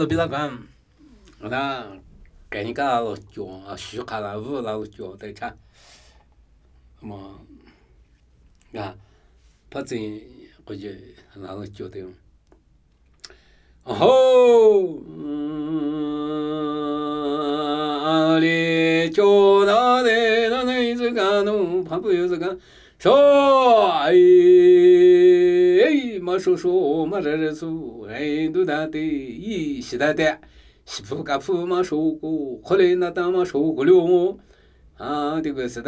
Namuyi Tibetan Ritual Chanting; Pe ka phse su

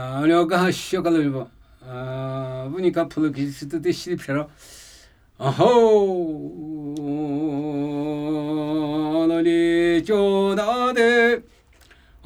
Namuyi Tibetan Ritual Chanting; Mbo kho bu su

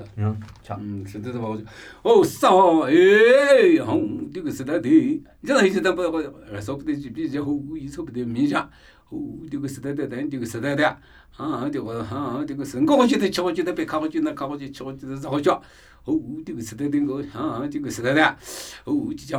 Namuyi Tibetan Ritual Chanting

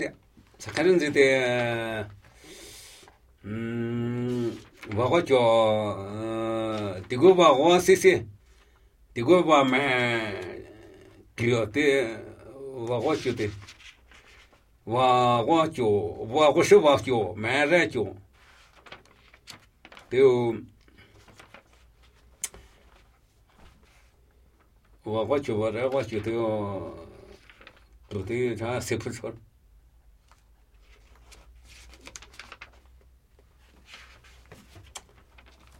Namuyi Tibetan Ritual Chanting

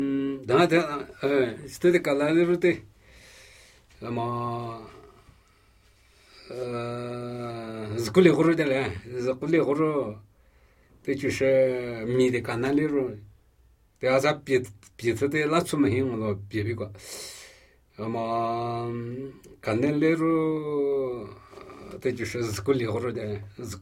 Namuyi Tibetan Ritual Chanting

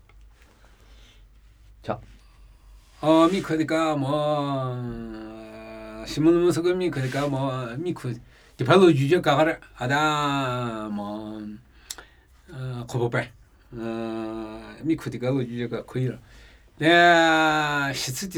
Namuyi Tibetan Ritual Chanting

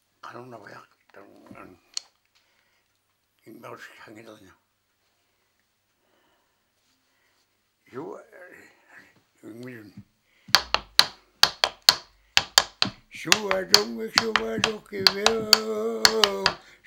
drum dance

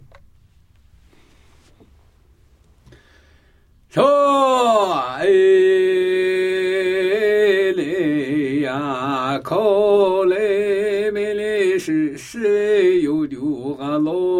Namuyi Tibetan Ritual Chanting

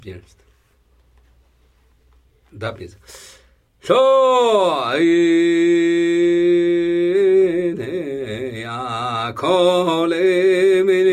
Namuyi Tibetan Ritual Chanting; Drumi mu dzo tso su

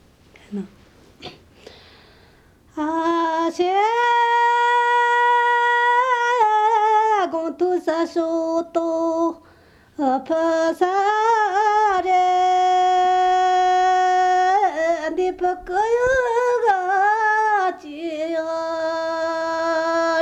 Dpa' sde Folk Song

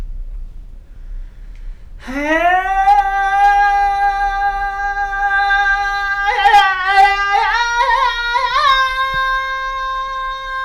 Dkyil 'khor Folk Song

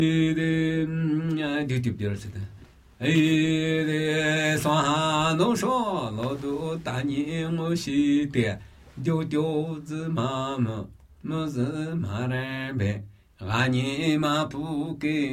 Namuyi Tibetan Ritual Chanting

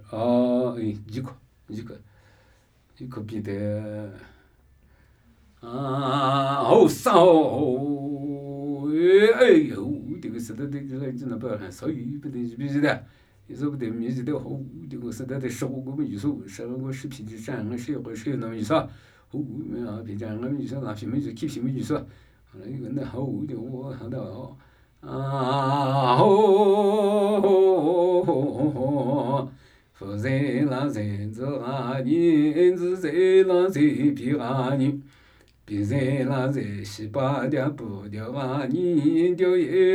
Namuyi Tibetan Ritual Chanting

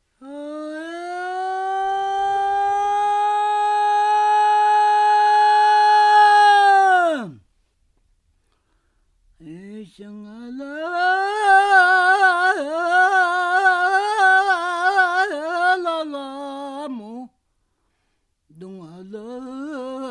Stong skor Folk Song

